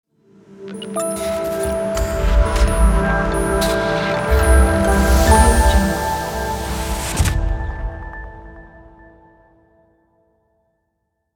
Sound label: logo intro; raspberrymusic